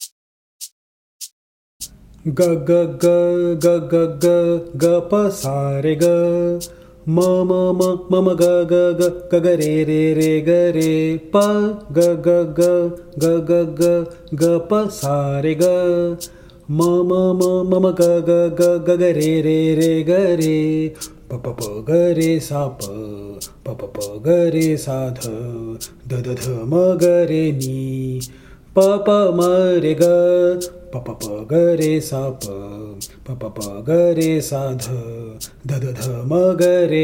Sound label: Jingle Bell Vocal; Bansuri Student Zone